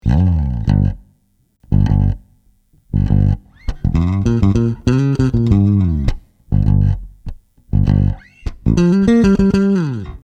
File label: intro bass; suhr classic j